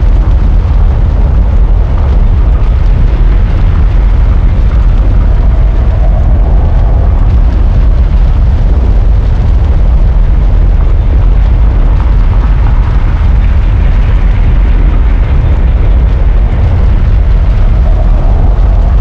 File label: Crack Revolve Loop